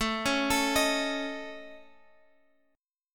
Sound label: A Major Flat 5th Guitar Open G